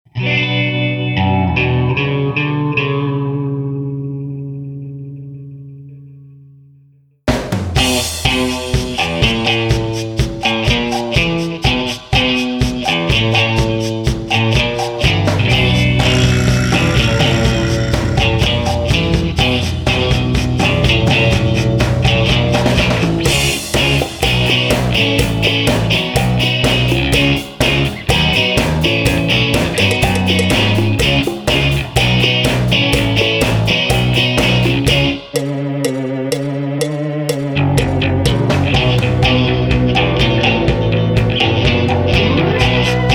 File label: Rockabilly